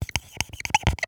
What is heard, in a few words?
dry erase marker write; prosoundfx